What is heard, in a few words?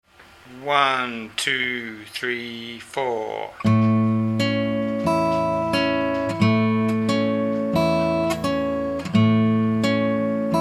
travis A2, guitar